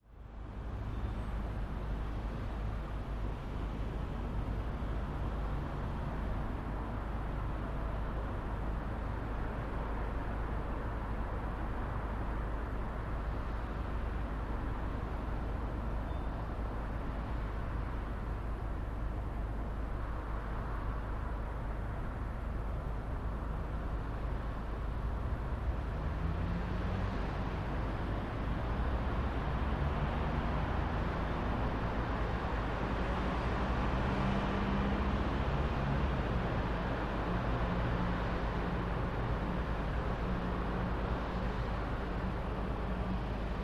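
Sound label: City Skyline Night Ambience; big city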